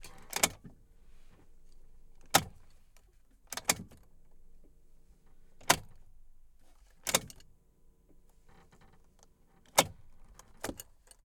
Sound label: Fiat RV - Ignition Key; Pole Position; Fiat-Sunlight T 67 2013; VAR SFX; MS Decoded